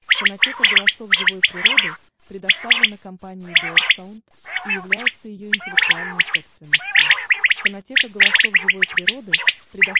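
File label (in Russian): Перепел QUAGLIA MASCHIO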